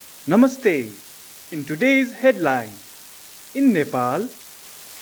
radio; electricity

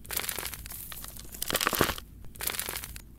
soil in pot

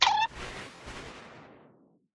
pin prick roti; dyslexia; karma and prava

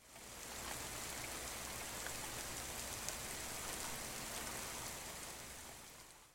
rain sound; air water and mud

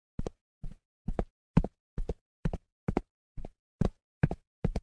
footsteps

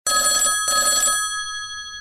telephone; sound

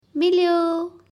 correct; time

